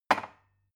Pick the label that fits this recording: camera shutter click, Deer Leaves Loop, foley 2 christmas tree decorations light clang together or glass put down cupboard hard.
glass put down cupboard hard